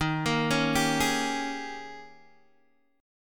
D sharp 11th D sharp, Guitar, Open G

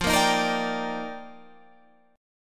F 13th Suspended 4th F, chord

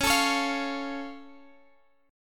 F Augmented

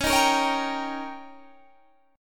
D sharp 7th C sharp, guitar

